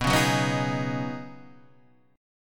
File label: Db 9th Suspended 4th B